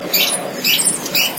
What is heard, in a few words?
bird call